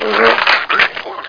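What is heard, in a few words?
tele death, player; Quake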